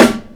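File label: dry steel snare drum sound a key, tuned to the A note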